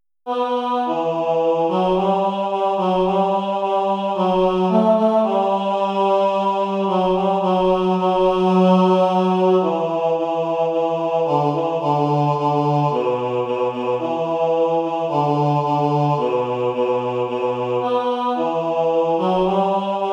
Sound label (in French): voix; 3 voix